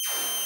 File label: Scanner